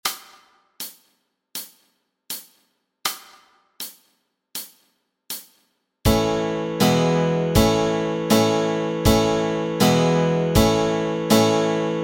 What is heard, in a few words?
Chords Track, Easy Guitar and Ukulele